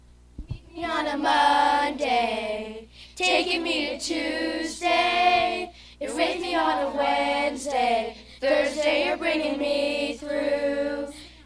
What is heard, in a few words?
Kids Singing; family camp